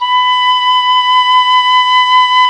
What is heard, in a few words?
FLT PICOL, Flutes, Picolo& Flt; Club-50 - Foundations Roland